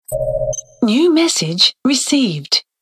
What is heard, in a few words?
message ringtone; idroid; galaxy sms ringtones